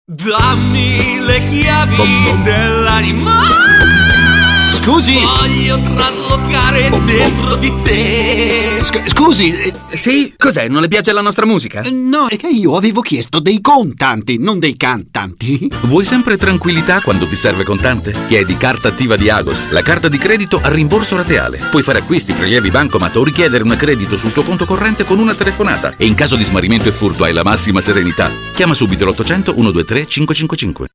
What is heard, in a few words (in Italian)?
attiva cantante